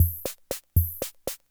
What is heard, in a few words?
Loop, classic machines, rhythm77